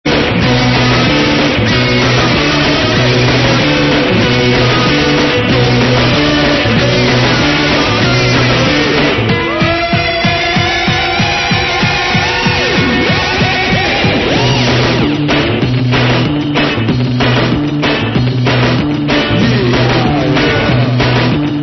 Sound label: BEAT